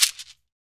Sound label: SHAKER, Afro Shakers, Shake&Rattle